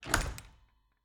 Cabin, front door, split door, oak, large, iron fittings, close, hyper; Eiravaein Works; Vaeyan IV